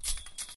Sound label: chain fence hit